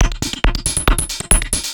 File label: percussion loops, Techno, 138